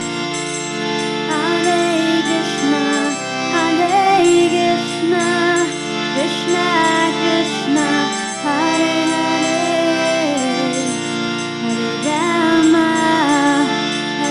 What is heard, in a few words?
melody, easy harmonium; sankirtan